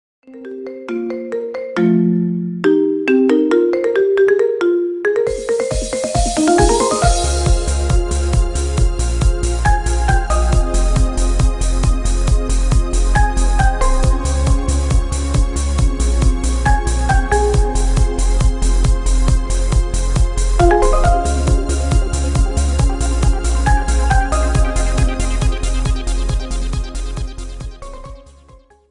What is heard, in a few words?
marimba remix ringtone